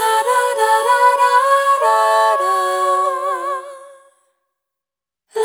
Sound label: Multi-Voice Loops, Vocal Samples; Luminara; Sonic Foundry (Sony Creative Software) - Pocket Diva World Class Vocal Samples